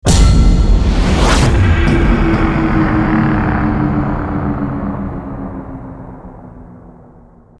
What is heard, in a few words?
Online Lose; Burnout Paradise